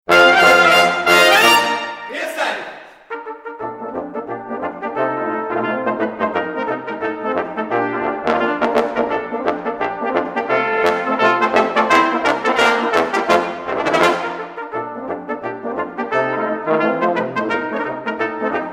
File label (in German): Polka